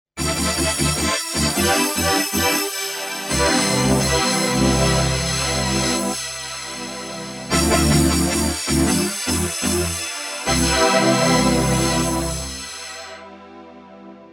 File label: Melting, yamaha sy77 pads